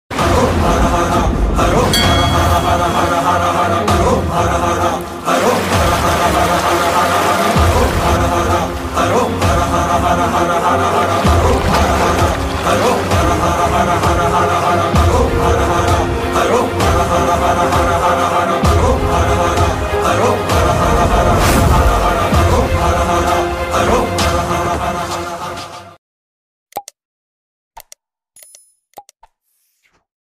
hd bgm climax bgm hd ringtone; zombie reddy; curing the zombie virus; mrityunjaya swamy

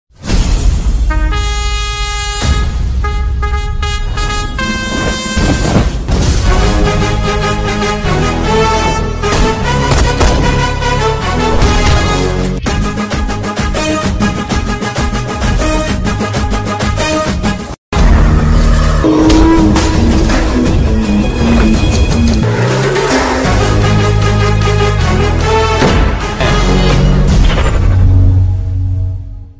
police roaring bgm ringtone; abba varam

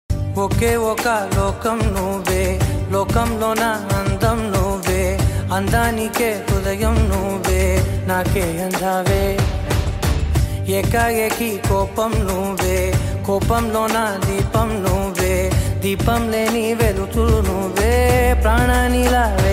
song, telugu song, telugu bgm ringtone

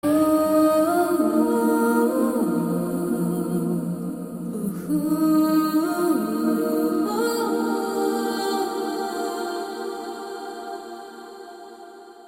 Theme

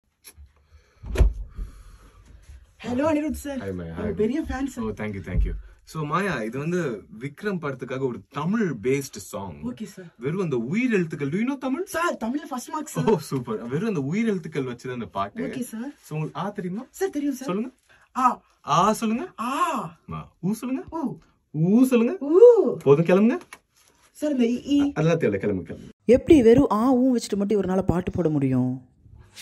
bgm recording; vikram

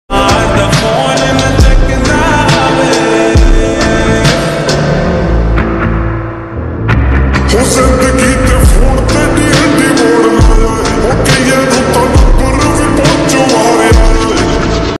slowed reverb ringtone

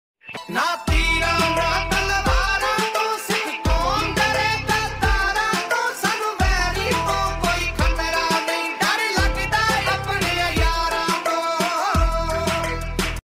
gurbani ringtone; satnam waheguru

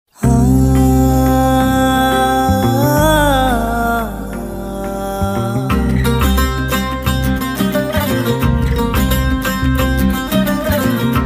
kannada ringtone love ringtone bgm tunes; ugramm; sri murali

